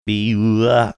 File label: funny vocalization